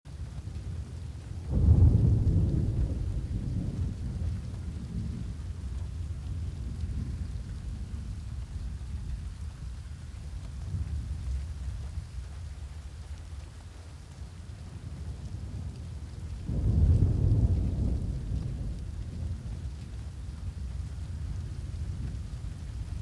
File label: thunderstorm